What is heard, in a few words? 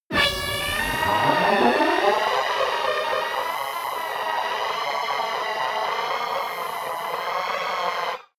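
rumble storm long, science fiction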